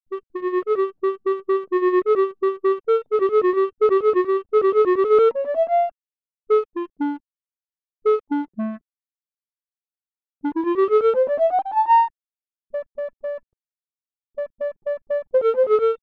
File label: Clar synth